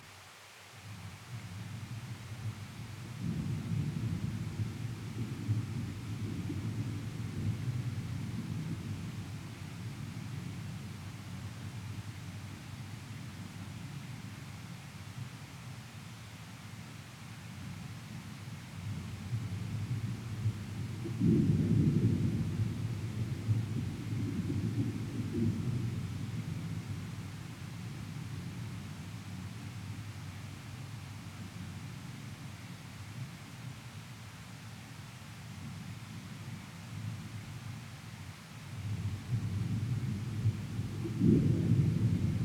Biome Noise Loop - The Great Sea; Music

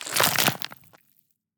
Gore; Damage

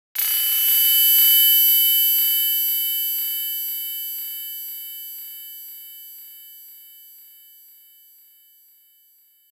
Tinnitus

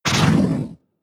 damage, Mudraptor

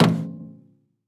footstep armor; Footsteps, Armor

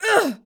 female damage

